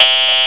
zap

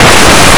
book; Blue Heat

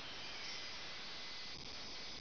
chimes; 12000 Graphics Pack - A Complete Graphics Collection For Your PC

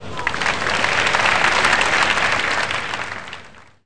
APPLAU

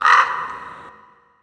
birds crow

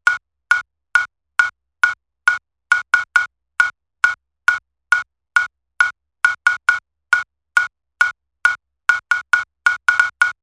Timbale

